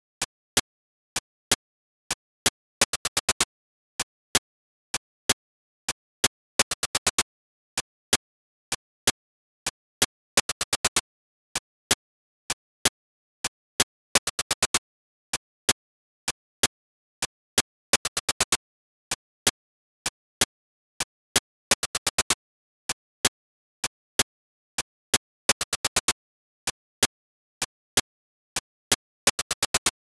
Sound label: Noise